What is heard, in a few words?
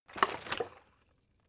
bottle water sparse upend slosh; berufe